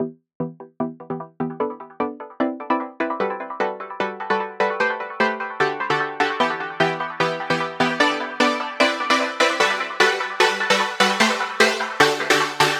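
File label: synth loop intro chords growing 150 E minor; Virtual Riot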